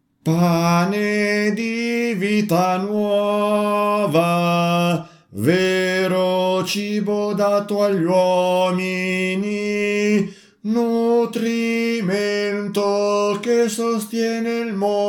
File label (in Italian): tenori